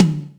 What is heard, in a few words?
Toms